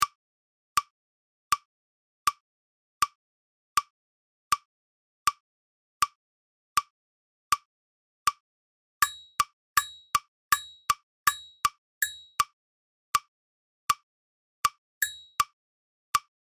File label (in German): 8tel-offs 80bpm